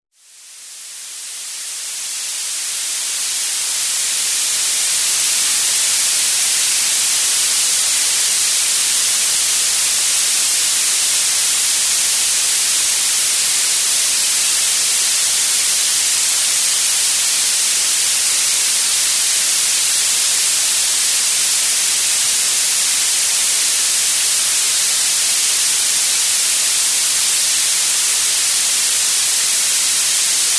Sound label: white noise